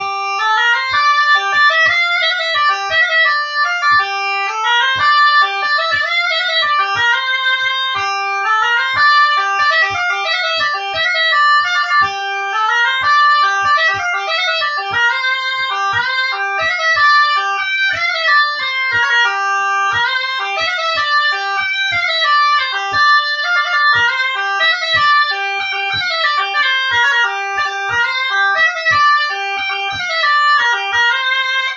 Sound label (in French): Pour danser la bourrée; Bourrees